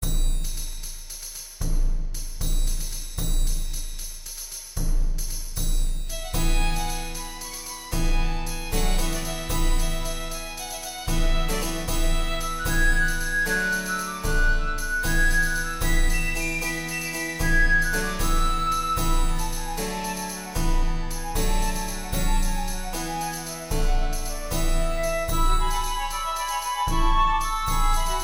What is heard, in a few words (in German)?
Tavernenmusik